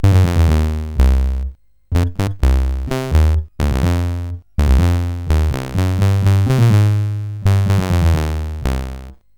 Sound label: Bass Sound Lauf, CSG8580R5; sid